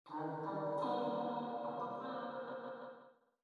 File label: bugle